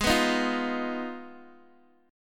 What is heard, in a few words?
G sharp Minor 6th, Guitar Open D; x,11,9,9,8,x